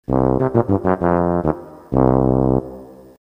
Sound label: tuba